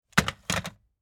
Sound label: Phone Hang Up